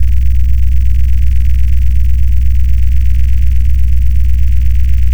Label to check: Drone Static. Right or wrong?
Right